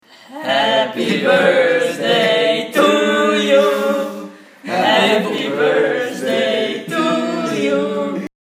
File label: singing; Senses vocabulary